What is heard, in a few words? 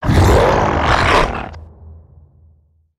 Gorilla Chest Thump Roar